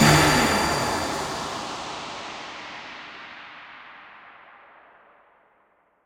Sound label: near Win End; berry burst